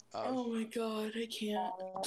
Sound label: screaming